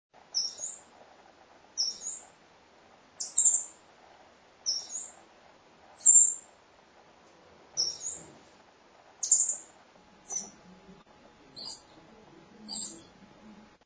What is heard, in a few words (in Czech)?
zaba samicka